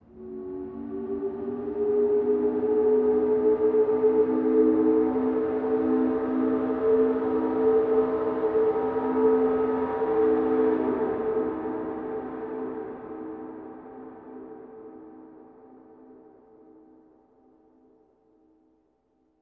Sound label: Horn Train Fog 1 Long